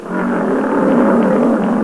lion; animals; mammals; cats; stamps

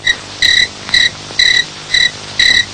grasshopper, insects; stamps; animals

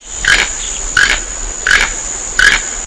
tucan, birds; stamps; animals

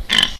pig; animals; mammals; stamps

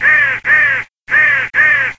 crow, birds; stamps; animals

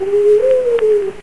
owl, birds; stamps; animals

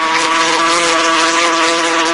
hornet, insects; stamps; animals

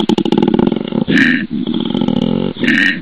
koala; animals; marsupials; stamps

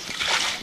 drake, birds; stamps; animals

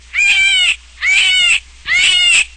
rabbit; animals; mammals; rodents; stamps

